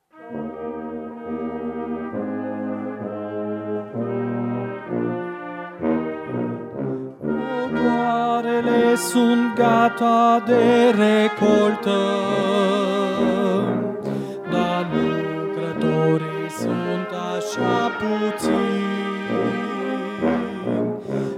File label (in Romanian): Fanfara